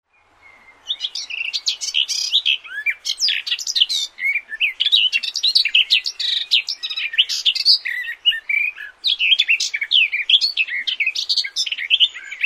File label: garden warbler